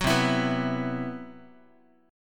C Major 7th Flat 5th C, Guitar; CM7b5 strummed

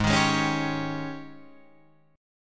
Ab Major 7th Suspended 4th Ab Guitar Drop D; down

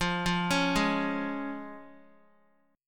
F Minor Sharp 5th F down Guitar; Fm#5 arpeggiated; JGuitar

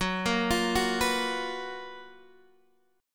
Gb Major 11th, down, Guitar, Drop D

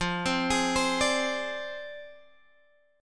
F Minor 6th F down Guitar Open F; arpeggiated

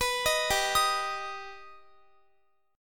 G Augmented G Guitar; arpeggiated

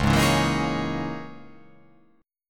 C sharp Minor 6th Add 9th C sharp

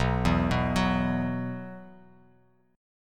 B Suspended 4th, Bass Standard; Bsus4 arpeggiated; JGuitar

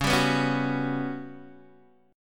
G Major 7th Flat 5th

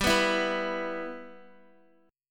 G 6th G, Guitar; strummed; x,10,11,9,11,x; 4F,4A,5C,5G,5C,6E

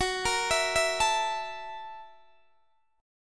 F sharp 7th Sharp 9th, Guitar, DADGAD

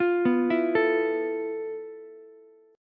F Major 7th Flat 5th Ukulele

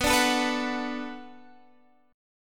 B-Minor 7th B down Guitar Drop D

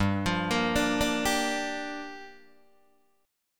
G Minor 7th, Guitar, DADGAD

